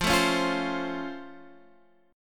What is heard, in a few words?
C sharp Augmented Major 7th F, guitar chord